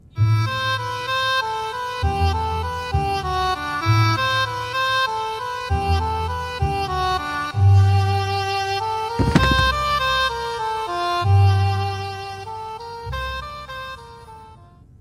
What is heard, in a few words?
BG Music